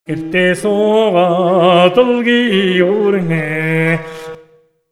MONGOL, Asian Traditions; USB Soundscan vol.60; AKAI

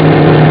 motor; Amiga Soundtracker sample

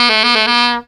FUNKY SAX; Zero-G; Funky Elements

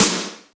Yamaha DJX 2B, SD Elec H

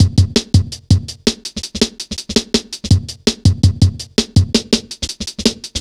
165, drum loops, drum & bass

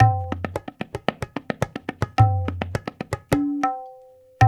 Tablas

